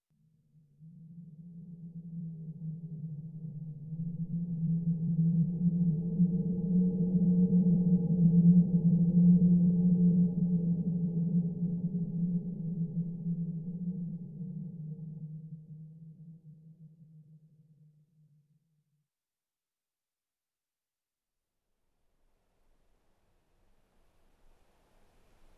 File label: wind sequence; Sound design for the opera composer: concepts and methods; Her face was of flowers audio cues